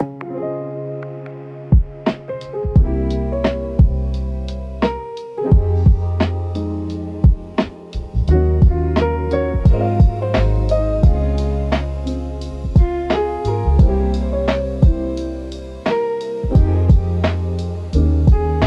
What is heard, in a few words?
Emoi Lofi Piano (for recall and nostalgia)